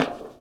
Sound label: bleacher step; Golden Crayon Sounds